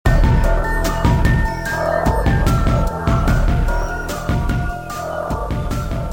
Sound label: human win; csgo